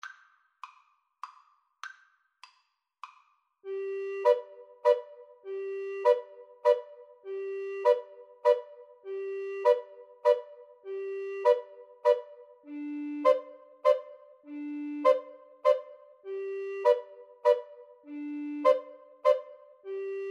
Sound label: clementine, Recorder Trio